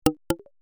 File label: drop